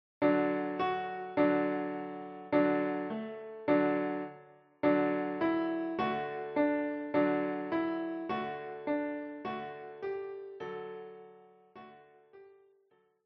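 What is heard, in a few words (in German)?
Are you sleeping, Klavier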